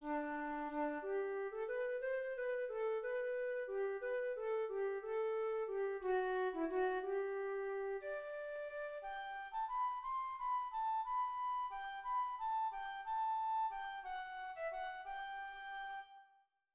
FLUTE

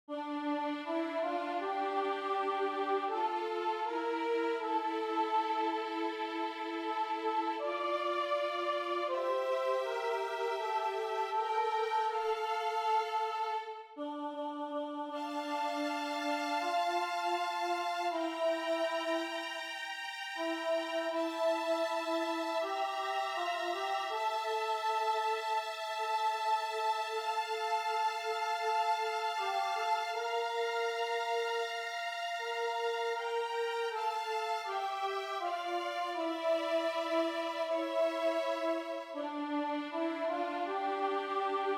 Psaume Violons

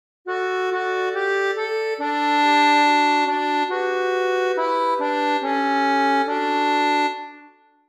CLARINETTE; Psaume88

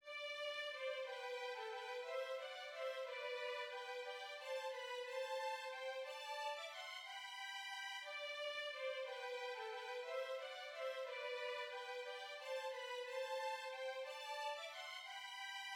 VIOLONS

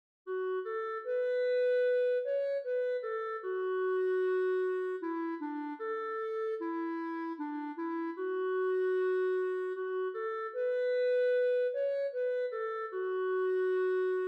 CLARINETTE

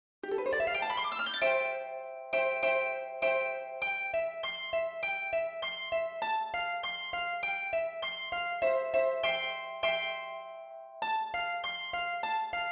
HARPE

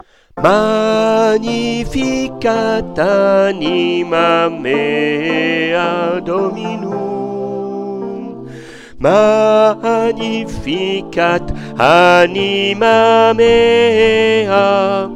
Magnificat SOPRANE

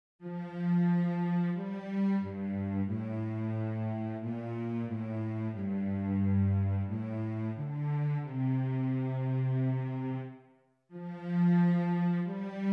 VIOLONCELLE; Tu Es Pretre a Jamais

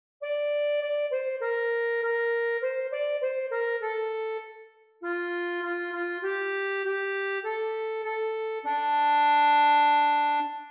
ACCORDEON

